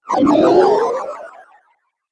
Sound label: Retro Videogame Fx Disappear Spell; Video Game Sound Effects